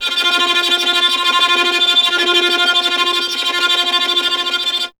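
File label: string violin tremolo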